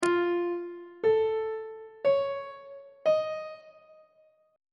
e sharp augmented 7th chord intervals on treble clef